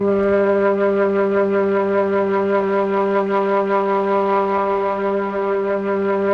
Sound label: MELLOTRON FL; Masterbits - Vintage Voltage